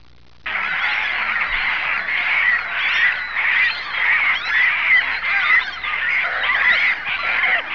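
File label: double-crested cormorant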